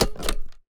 Click

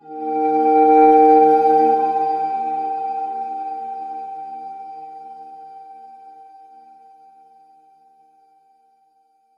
Backing; Arc Apellago

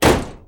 Thump

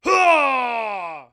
airslam

